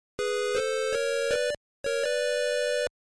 Arcade game 16 bit or 8 bit level complete win success; Success Sound Effects; Video Game Success